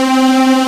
Aud Synth C4; Digital Sound Factory Xtreme Lead

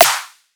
normal snare

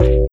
Metals Kick; Sonic Foundry (Sony Creative Software); Esoterik Beatz; Metals Kit

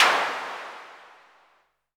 clap, Novation Bass Station 2

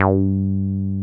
303 G3 mf; Drums In Your FACE!

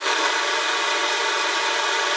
WEQS C4, ALTOSAX; Xsample VOL-2

